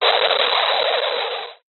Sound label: science fiction radio signal interference burst